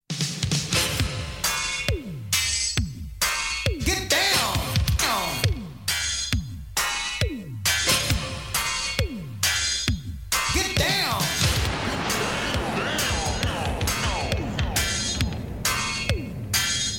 Jingle

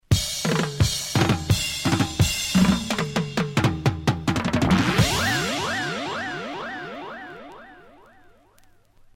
Jingle

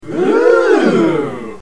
Grp Ooo

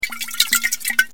Water jug

